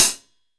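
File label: closed hi hat, 14 inch old hi hat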